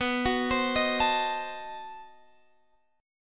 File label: B 7th Flat 9th, down, Guitar, Open G